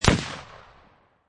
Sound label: Rifle Thompson Center Encore 7Mm Single Shot Exterior At 45'; at 45 feet